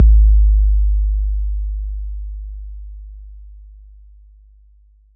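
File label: bass drum 808 Tube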